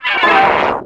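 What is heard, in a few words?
droid assassin attack; Star Wars Knights Of The Old Republic I; XBOX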